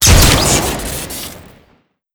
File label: rocket jumper shoot crit; tf2